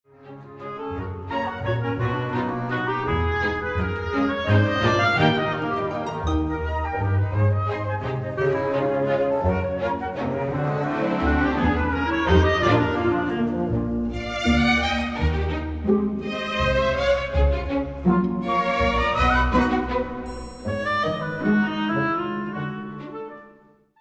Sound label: Jig